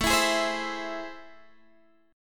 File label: A Minor Major 7th, down, Guitar, Half Step Down